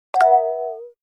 battery low, Nokia

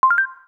send, keytone; Samsung SGH-T369 Linx; Paragon

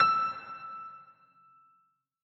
dial tone piano star; OnePlus One China

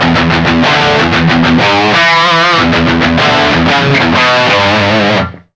Rocking Puppets; Philips; Multimedia Studio

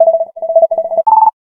3D SMS Tone

